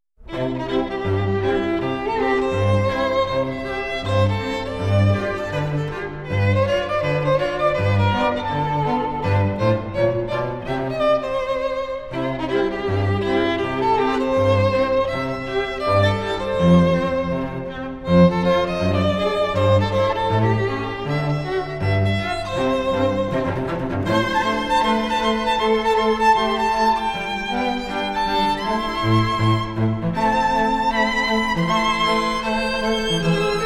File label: String Quartet